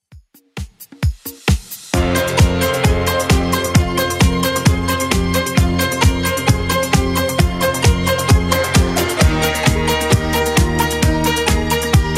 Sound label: 70s, 132bpm; Redrum